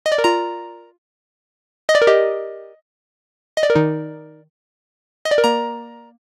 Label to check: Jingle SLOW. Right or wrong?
Wrong